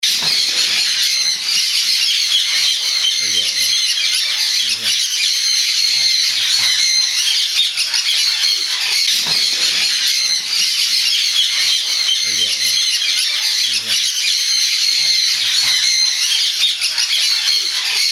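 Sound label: Conures (mixed species), parrot calls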